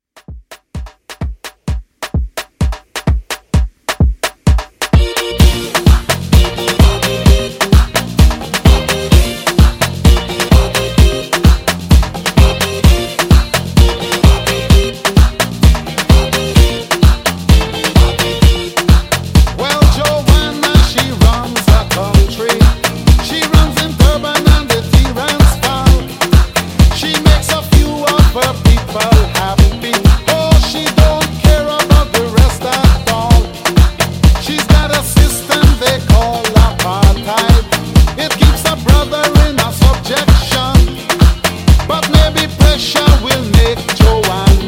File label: 80s Afrobeat Re-Drum 129